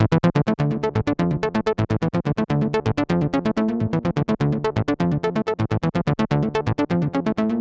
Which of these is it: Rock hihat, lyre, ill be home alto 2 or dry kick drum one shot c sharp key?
lyre